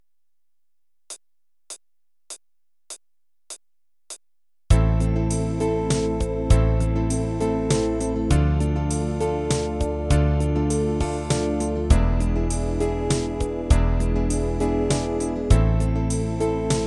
Am Cadence

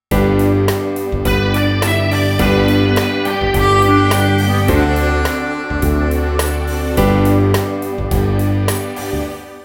ballad

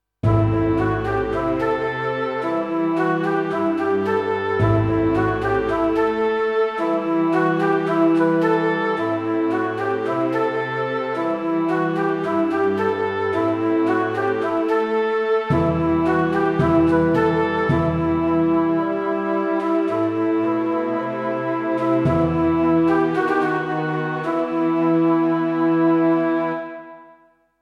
Em Riff symphonic 110

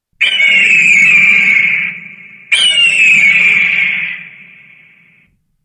falk cry

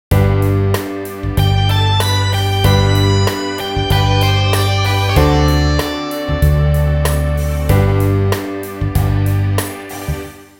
ballad

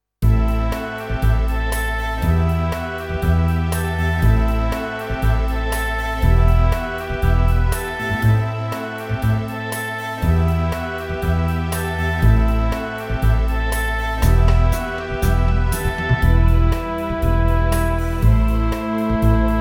Em Riff Rock Ballad Oboe 120

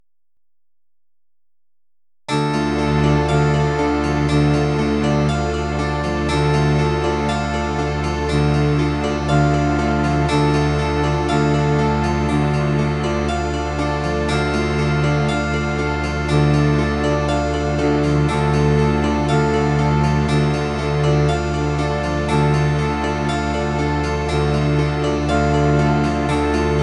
Baroque Piece in Dm norm. w. echo

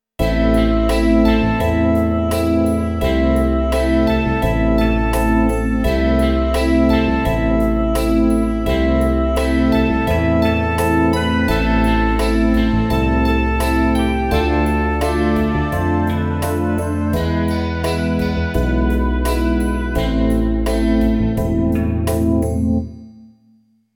Melody Synth Lead

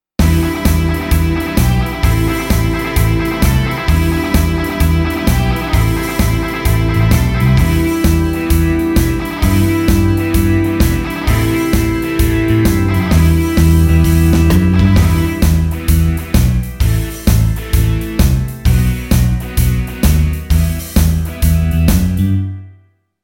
Rock Rhythm in Em 130 bpm

